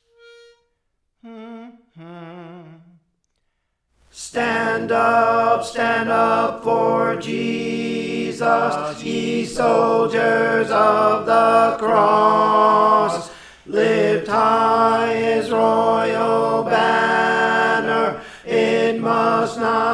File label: Tenor; Praise For The Lord Training